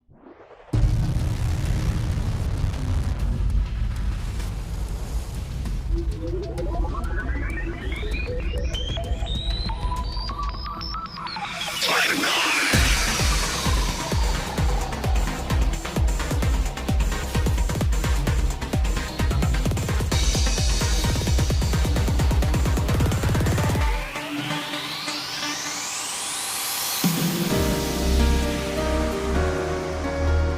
Cinematic Trailer Theme; TRIBE NINE

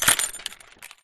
Chains

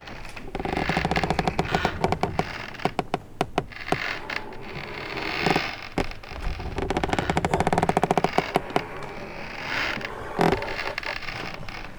Rope Bridge Creak

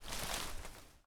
Plant Move

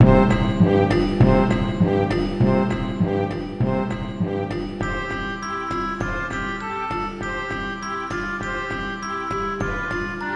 Town

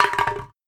Impact Can

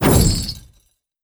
Chain Swing

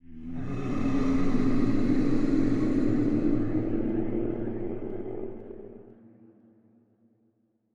Curse Hit

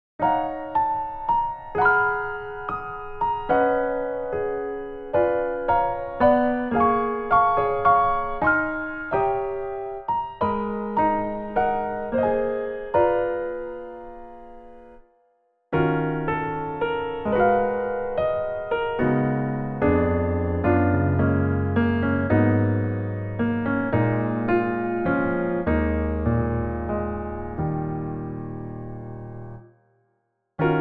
Piano Solo; the legend of heroes kai no kiseki farewell o zemuria

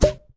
Blowdart

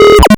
Fx Glitch Ring; bass house; fx vocals

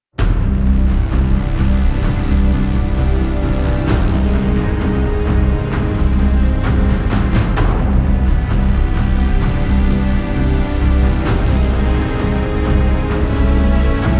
Buildup; Tekken Blood Vengeance Original Soundtrack

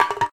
Impact Can

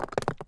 Stone hit

